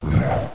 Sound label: ZOMB; THE REALM